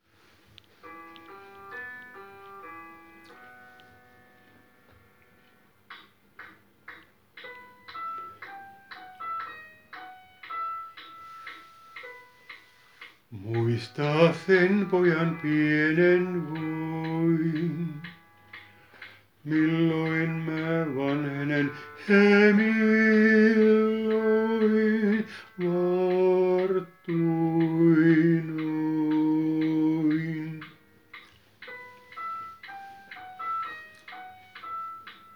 Nousee päivä tenori

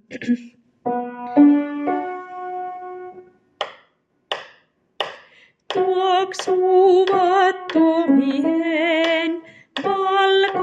Tuoksua tuomien, sopraano

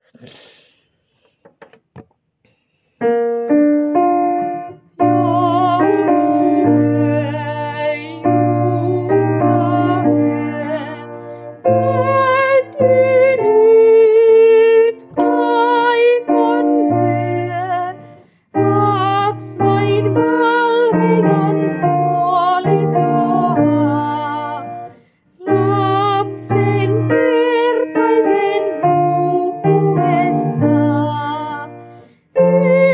sopraano

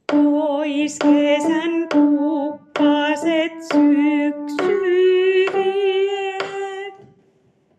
Syyslaulu, 2. sopraano

